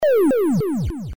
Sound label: eaten; pacman